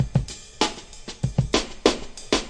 ride journey, drum loops and breaks, 096 bpm; 091 to 100 bpm

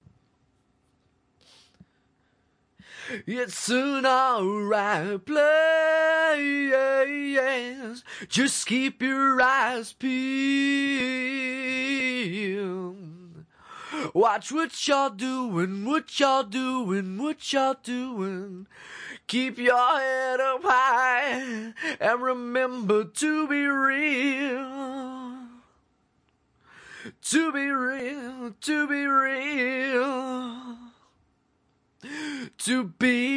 male vocal, 180 bpm male acapella

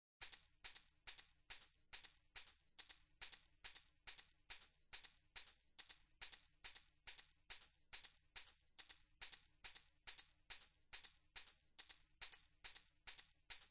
Shaker; Telephony

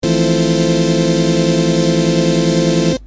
farfisa chord fmaj7; Pads

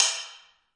african pe med

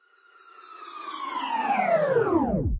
Rev drop fx; drums; hip hop; STYLE KITS (Hip Hop, Etc); Khezie Hip Hop Kit

